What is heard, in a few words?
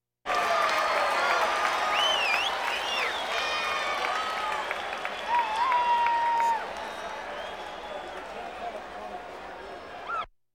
Crowds Race cars Baseball